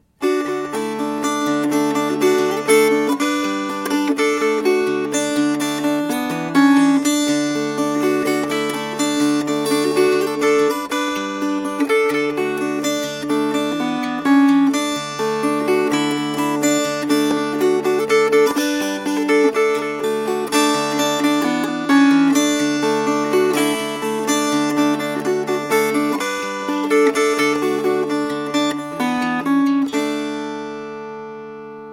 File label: Fingerpicked; Cumberland Gap